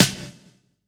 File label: Elec Snr, drum